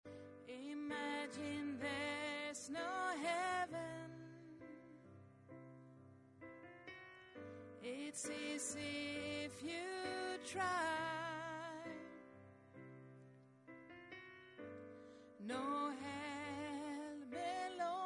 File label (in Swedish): bas